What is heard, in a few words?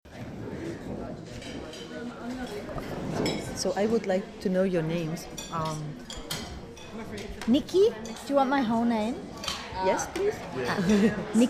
Singing for our cooks; Earth Song Reclaiming Witchcamp Australia 2019